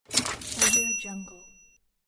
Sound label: cash register cha ching